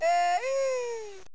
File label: aieee